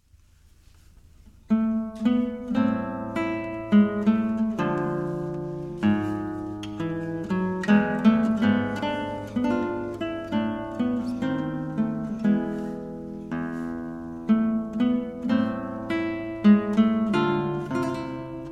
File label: sarabanda live